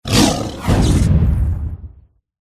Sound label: tiger